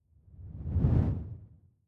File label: whoosh simple flutter low